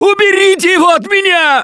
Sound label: scream while pounced; Gambler; russian